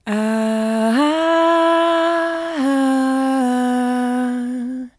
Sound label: Vocal Melody - A Min; Cymatics; Light Free Sample Pack; Bonus Samples; Vocals